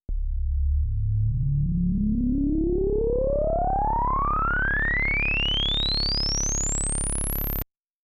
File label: Ladder Reso; synthmaster